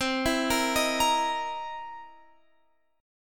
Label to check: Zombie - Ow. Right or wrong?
Wrong